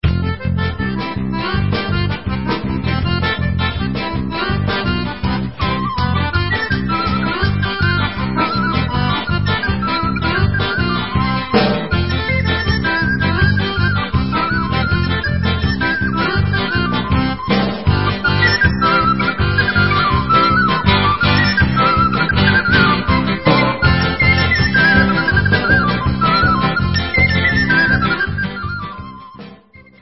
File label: KRAKOVIAK instrumental polish folk